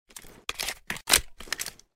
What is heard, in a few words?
glock17 reload tactical; EMXArms; pulse